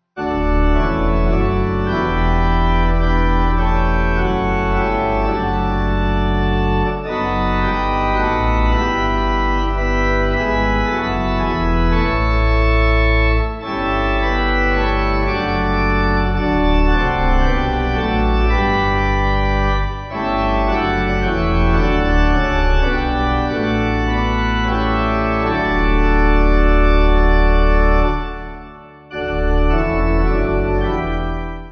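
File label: Pipe Organ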